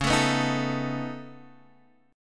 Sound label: C sharp 7th Suspended 2nd Sharp 5th C sharp down Guitar DADGAD